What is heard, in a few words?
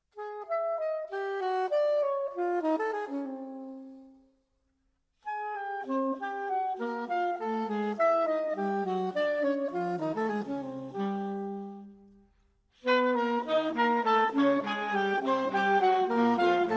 Sax Satie